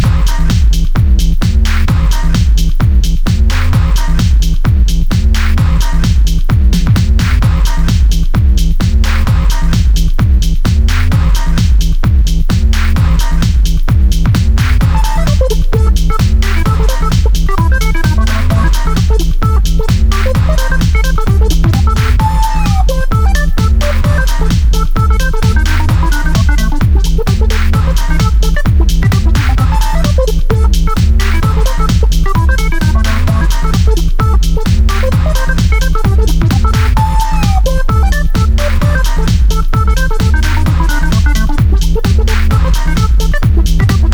Elec; Google; NewWave Labs

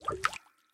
ripple unlock, phonetones, ui; LG G Pro 2 D838

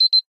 Alarm Beep

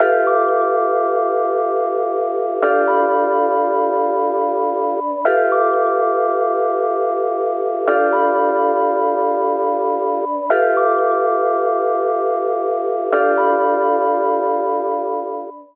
ringtone chimes; The Light Phone II

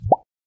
Email Sent

